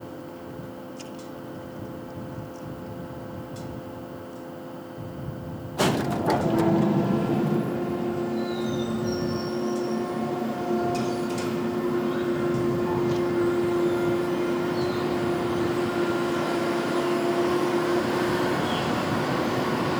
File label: Soundscape: La Silla NTT dome opening